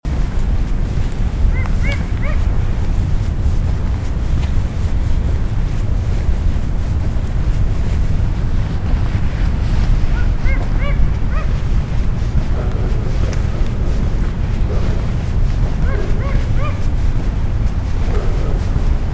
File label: low call